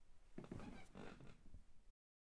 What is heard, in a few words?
Squeaky Mattress Quick Single Squeak